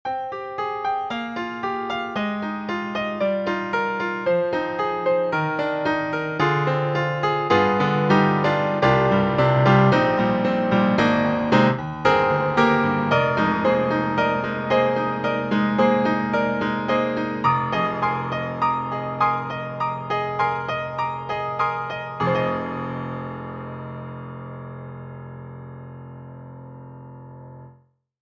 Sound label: Cakewalk D-PRO Grand Piano